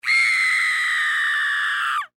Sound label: Human Female Scream Long; Screaming (Sound Effects:Voice)